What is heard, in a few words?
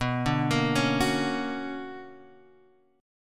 B Minor Major 9th, Guitar, Open D